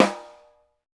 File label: Snare, Roland LA Composer